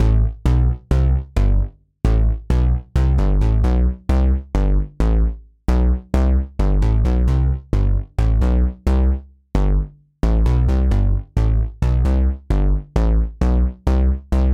Poly Bass

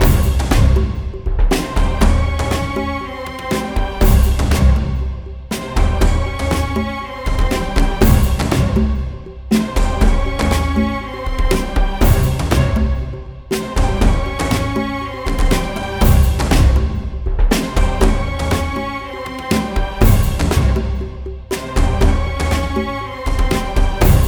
Lava Level loop; Rezrog; Depard; Gems of Rezrog

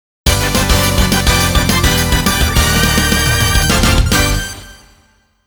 Scenario Success

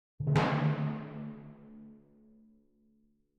Timp Hits Intro; Factory

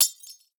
glass hit; teardown; ps5 windows xbox series xs

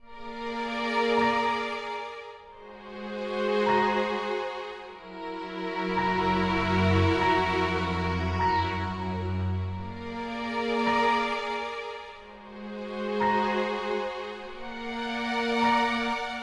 Forest World Exploration; sky break

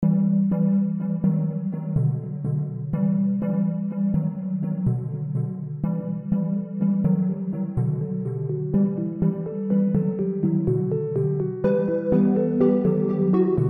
MUSIC STORY GREEN CALM

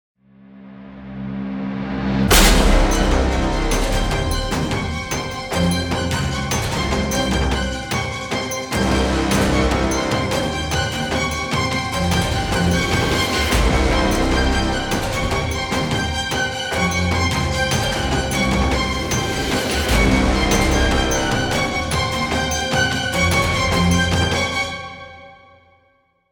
boss coastal normal, 150 7 8

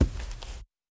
walk carpet

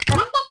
Chick Leave; lego my world first steps

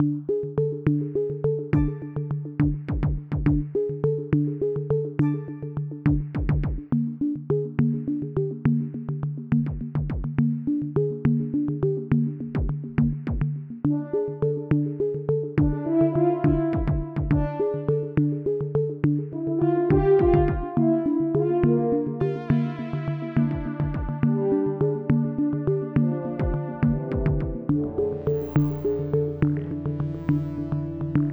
Atmospheric Rewind; Replay VHS is not dead

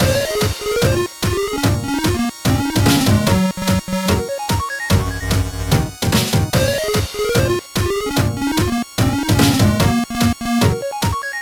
Battle F; SanctuaryRPG

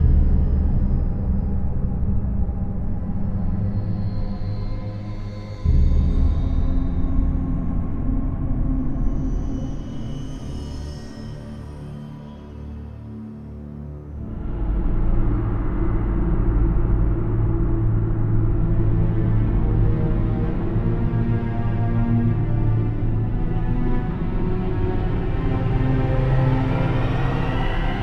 Arena Castle Vortex Calm; Dungeon Hunter 3; android ios

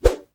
Target Mouth Throw; the princess and the frog; Diner

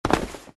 Land

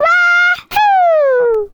Classic Miss Sound; Jolly Battle; Android

